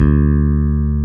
E-Basses, Roland

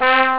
trumpets; ST-XX Amiga Soundtracker Sample Packs

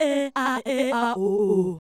Fem Vocal 128bpm G FX, African Voices

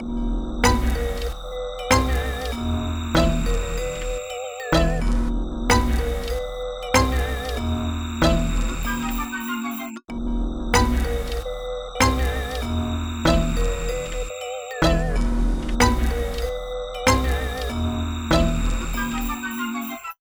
Melody

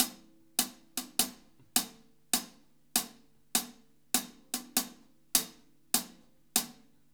6/8 Loop, Drum, Laid Back; Sonic Foundry (Sony Creative Software); Loops & Samples Drum Tools

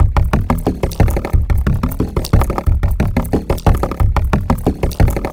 doorknocker 090bpm; Zero-G; Downbeat Dance Grooves

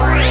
DD SYN, Disco House

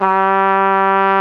FLUGEL G3, WIND; AKAI S-Series CD-ROM Sound Library VOL-2

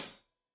Hat Tight, Hi-Hat; Deeply Sampled Drums

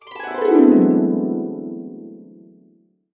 D, Harps; USB Soundscan vol.62; AKAI

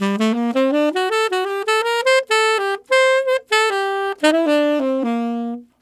Tenor Swing Shuffle in C7, Tenor Sax Loops; Sonic Foundry (Sony Creative Software); Jazz Solos and Sections

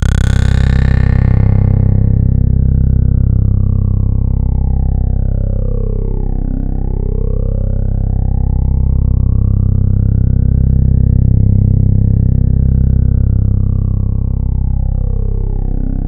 C1, Roland JV 2080 TECHNO PAD

